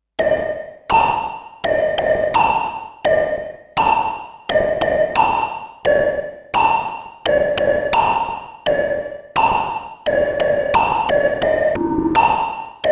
Percussive wind unison, Sequential Circuits Six-Trak; Factory Programs